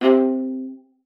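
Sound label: C5, viola spiccato; Xsample VOL-5; 90 s Sample CDs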